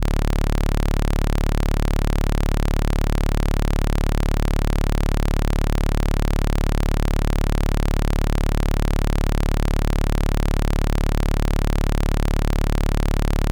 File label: Osc output SAW; modular; ac-coupling